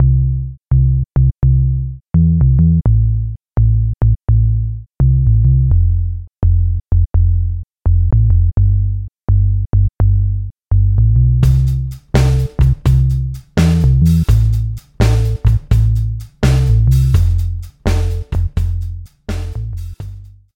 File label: bass